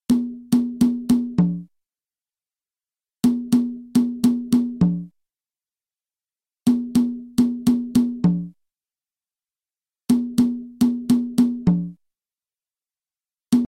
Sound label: guarapachangueo cajon